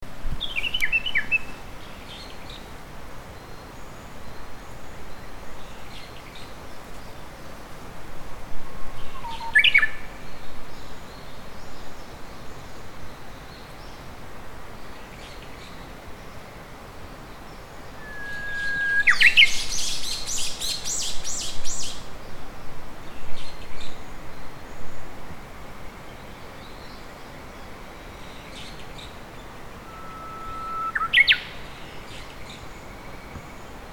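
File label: Japanese bush warbler; uguisu; iouyama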